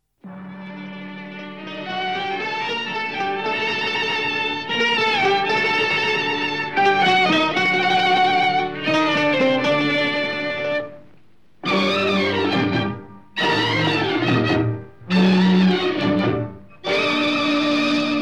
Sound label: Dance Music Instrumental; bollywood; Yahudi (1958)